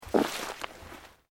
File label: Plant Leaves Large Move Slow; Material components for constructing more complex sounds; Rustling